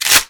shotgun cock back

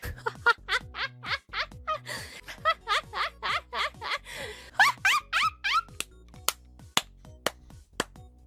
taunt haha ha hahaha; phx new taunts pack; props taunts new

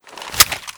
smg1 deploy; weapons

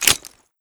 grenade pin pull

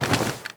weapon movement sprint; weapons

